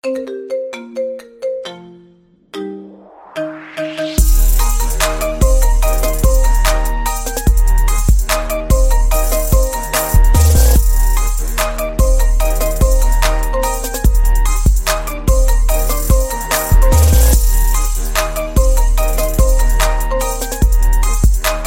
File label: marimba ringtone